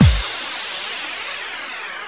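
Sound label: bass drum FX, dance drums; Best Service Dance Mega Drums; BD-FX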